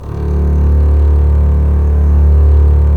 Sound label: STR, Roland LA Composer; Roland - LA Composer vol. 4; Full Mutes